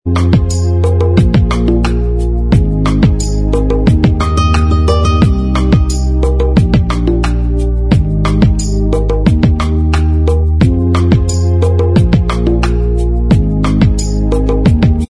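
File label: instrumental Track, backing tracks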